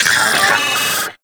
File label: Arachnoid Vocal Leap; Enemies; Vocals; Warframe; Corpus; Arachnoid Hunger